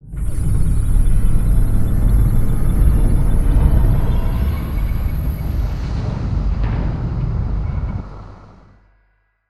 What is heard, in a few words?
scavenger bot move start; Stellaris; distant stars